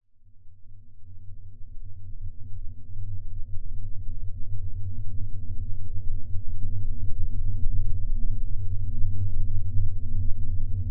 science fiction spacecraft ambience interior droning subtle futuristic; zapsplat